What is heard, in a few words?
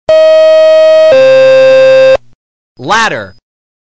FDNY Alert Tone